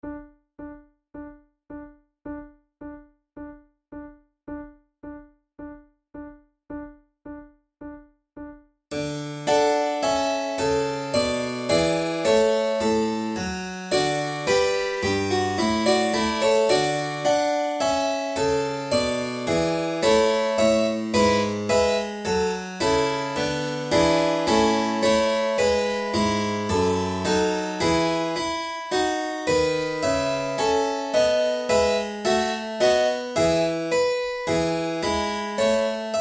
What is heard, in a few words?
allegro, flute; corelli